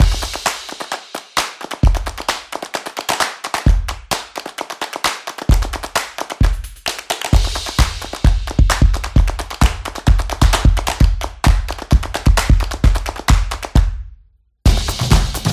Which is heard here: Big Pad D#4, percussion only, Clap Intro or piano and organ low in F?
Clap Intro